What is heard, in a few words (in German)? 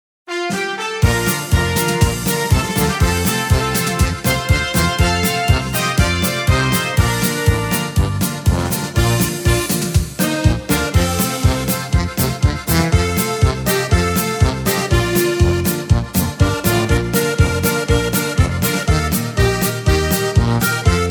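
Pfaelzer Stimmungs Medley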